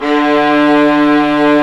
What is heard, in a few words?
STR, Vlas Sect, Agitato; STR Vlas Sect1-2; STR Vas1 Agitato